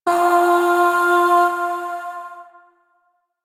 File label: Shawzin Oct Two F; Warframe; Zariman